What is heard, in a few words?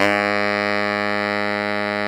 B SAX